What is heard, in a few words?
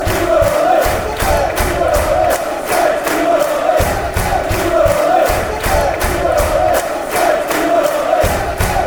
green and white army ytfc fanchants